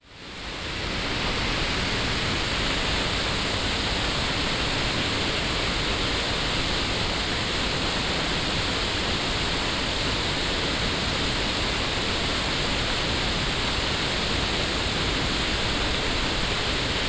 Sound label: gluggafoss